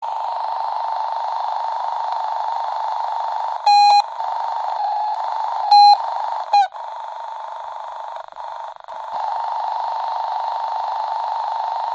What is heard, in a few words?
technology walkie talkie radio interference feedback